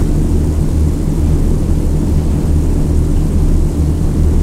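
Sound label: avro lancaster cockpit left, engines; War Thunder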